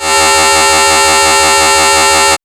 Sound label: Moog D 4 -L; AMG - Vince Clarke Lucky Bastard